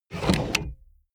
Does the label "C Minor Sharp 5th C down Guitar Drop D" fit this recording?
No